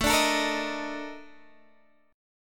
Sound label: A sharp Major 11th, chord